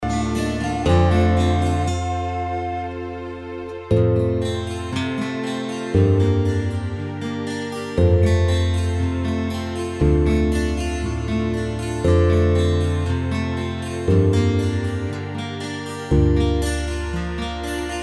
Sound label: Karaoke Song